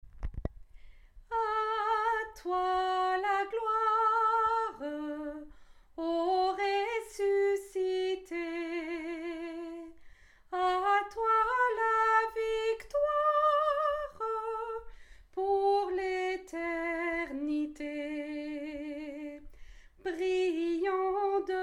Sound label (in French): SOPRANO, atelier de chant a plusieurs voix